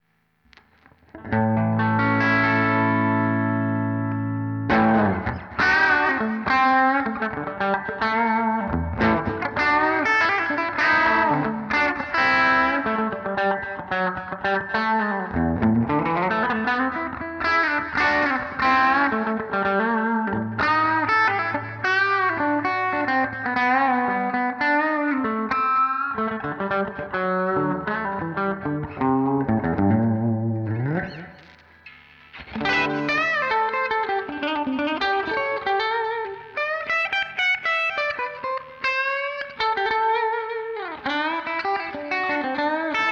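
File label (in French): SH4 - son clair, micros montés en position bridge, Gibson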